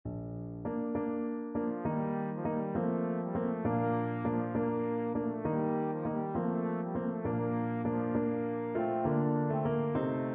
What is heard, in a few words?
siciliano, trombone